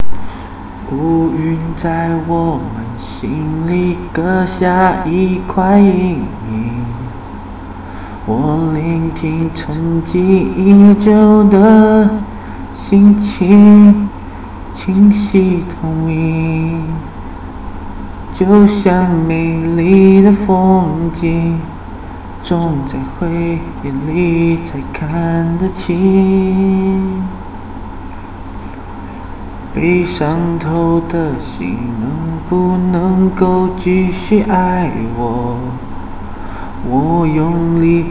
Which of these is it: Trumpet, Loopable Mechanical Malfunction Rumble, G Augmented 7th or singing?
singing